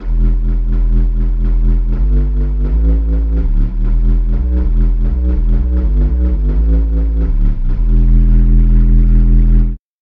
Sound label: pan flute bass